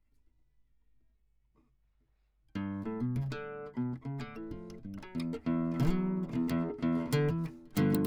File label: Classic Guitar